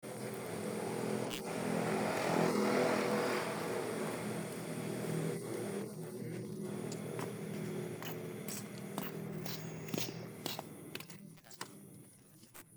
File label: no vocals